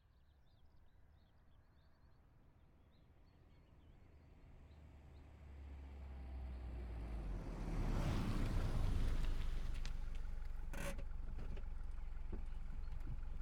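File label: vw polo exterior fast up stop idle; VW Polo 2003; ORTF; MKH8040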